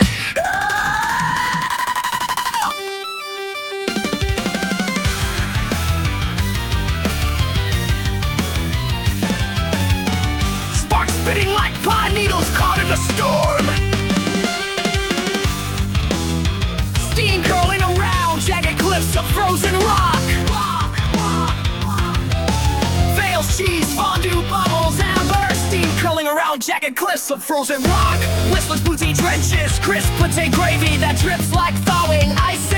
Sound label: Guitar Wail Revving Like, Hair Metal; Braxis Songs